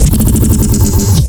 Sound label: LFO bass drop; neuro; BIG5AUDIO